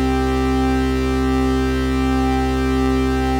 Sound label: Synth Note Modular Church Organ